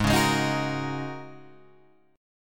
G sharp 7th Suspended 2nd Suspended 4th G sharp Guitar Open G